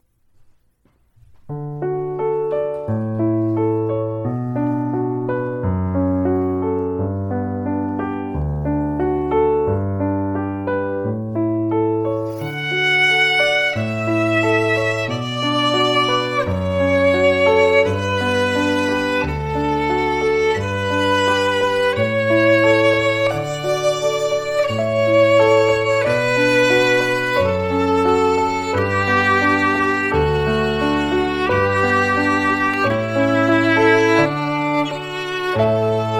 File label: Canon in D, violin